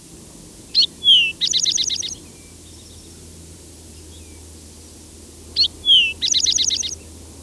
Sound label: Rufous-sided Towhee; ibm glen